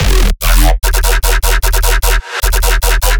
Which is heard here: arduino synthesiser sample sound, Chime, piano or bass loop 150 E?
bass loop 150 E